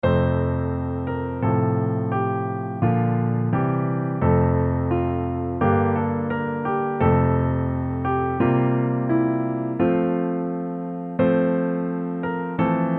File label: Better LH Chords